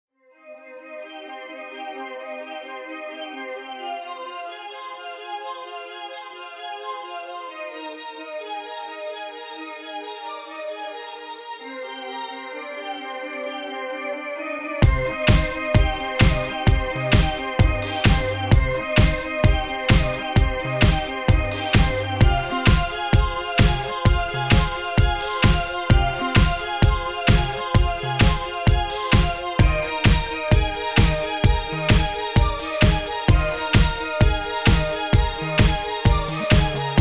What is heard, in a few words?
Instrumental Version